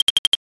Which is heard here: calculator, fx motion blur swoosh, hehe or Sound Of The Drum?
calculator